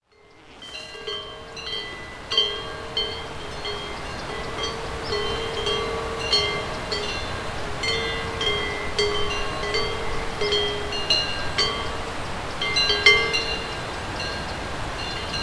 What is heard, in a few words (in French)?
Cloches des vaches; MZ-N710; Predaval